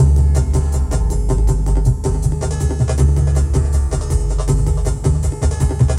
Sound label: Drum n Bass Beat; Sonic Foundry